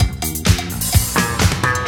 Fill Ins 128BPM; Vengeance Samples; VEH2 Loops

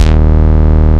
Root D#, Bass

